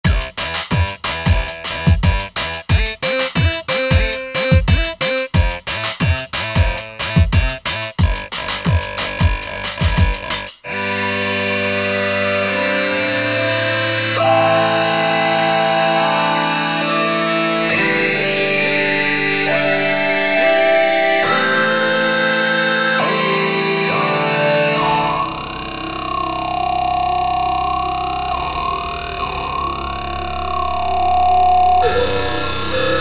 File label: Formant Synth; Formant Synth 3.1 (Redux)